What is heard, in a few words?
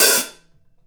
Hi Hat Hit; Essential Percussion